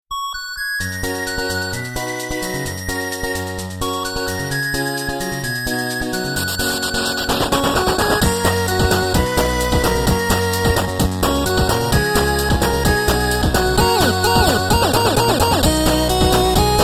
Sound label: 8Bit